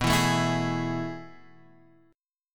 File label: A Major 7th Suspended 2nd